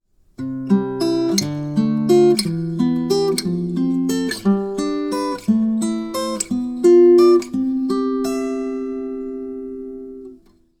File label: Spread triad, all the spread triad chords for every chord related (a.k.a diatonic chords) in the key of C Major, guitar; Spread triads example 5